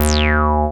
303 Short Release; Zero-G Analog To Digital 1 & 2

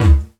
Didgeridoo Kit Samples

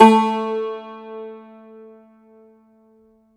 Cumbus SAZ A, Plucked Strings

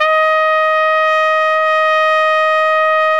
solo trumpet; Best Service ProSamples vol.25; AKAI

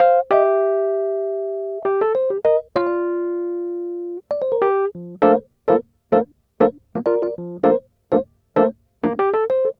Smooth Groove Rhodes, 70s Analog Funk

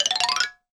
balafon; World Instruments 2; WORLD PERCUSSION; PS BALAFON

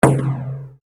Percussion, EuroDance

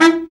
BARI, BARITON SAX; Sound & Vision - Gigapack II CD 1

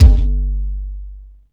NOISE BD

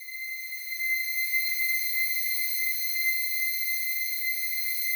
slow strings C6, Yamaha VP-1

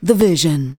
vocals sung; Techno Trance Essentials

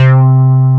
SUP BASS; Syntec; Wall of Sounds

